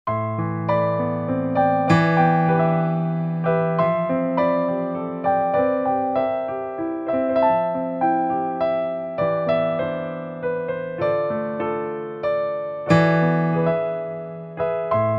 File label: piano; クラさん